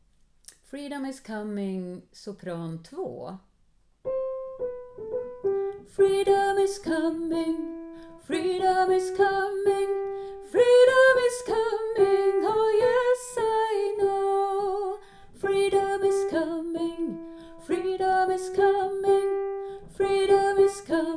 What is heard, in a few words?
freedom is coming sopran; alla kan sjunga koren vi sjunger i höst